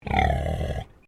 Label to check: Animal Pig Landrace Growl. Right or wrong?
Right